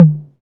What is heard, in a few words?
low tom single hit d sharp key, tome drum sample tuned to the D# note; tom drum samples